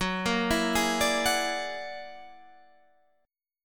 F sharp Augmented 9th F sharp Guitar Standard; F#+9 arpeggiated; 14,13,14,13,15,14

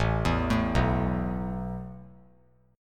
A Suspended 2nd A; Asus2 arpeggiated

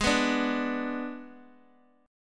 G sharp Diminished, Guitar, DADGAD; x,x,x,1,2,0

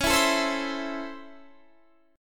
Db Minor Major 7th Sharp 5th Db, Guitar Standard; DbmM7#5 strummed; JGuitar

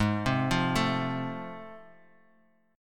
F Major, chord; arpeggiated; F/Ab

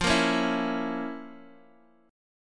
F Major 7th Suspended 4th Sharp 5th; strummed; x,x,3,3,2,0; JGuitar

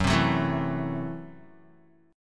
Gb Minor 7th Flat 5th, Guitar; strummed; JGuitar

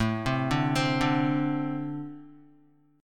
A-Minor Double Flat 5th A Guitar; Ambb5 arpeggiated; JGuitar

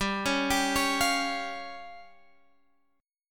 C sharp Major Flat 5th G

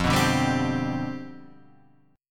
C Major 7th Suspended 2nd Suspended 4th F, chord

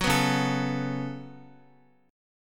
Db 7th Suspended 4th Sharp 5th Db down Guitar

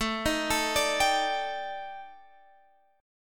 Eb Major 7th Flat 5th A, guitar

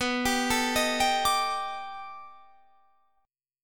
B 7th Sharp 5th Sharp 9th, guitar; arpeggiated

